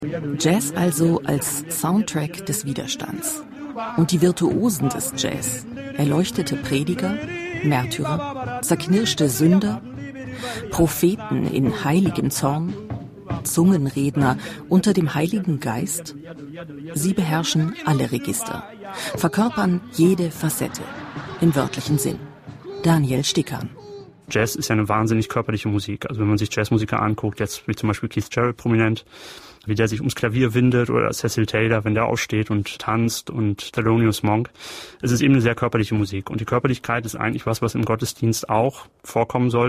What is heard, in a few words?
Jazz; Bayern2; Jazz und Kirche